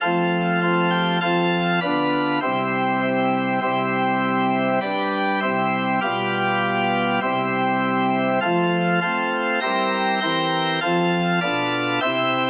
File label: bohemian christmas, organ